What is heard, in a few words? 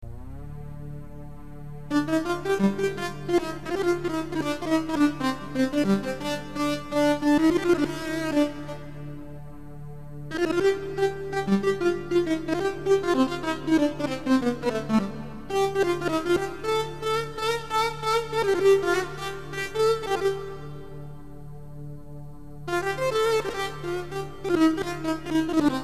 kamenche